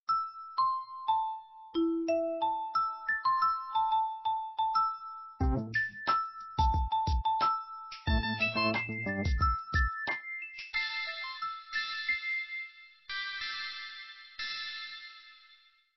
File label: exclusive tone